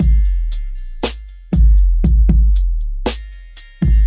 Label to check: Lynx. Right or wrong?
Wrong